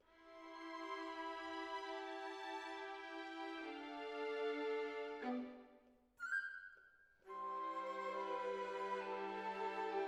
Cello Solo

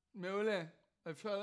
TB GTR

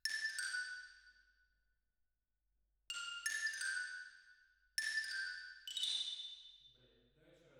Xylo

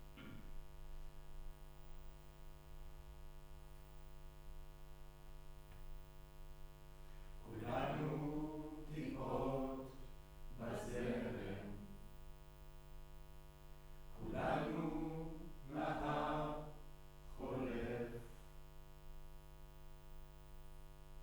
Room, Choir

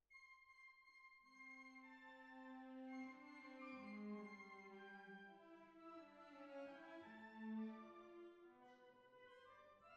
Cbasses

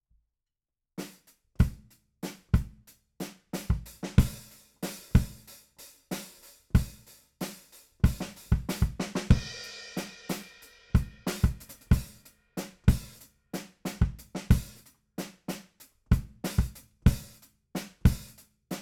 BD Out

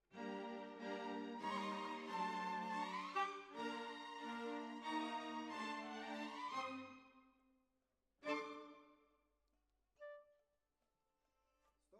Oboe

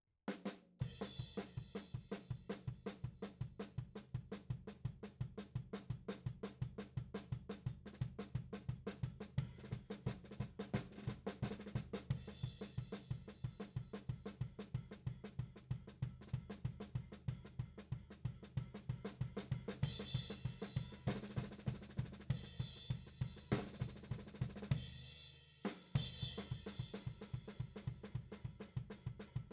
Tom

